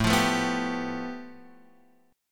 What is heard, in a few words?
A 7th Suspended 2nd Sharp 5th A, Guitar Open F; strummed